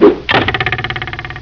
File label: arrow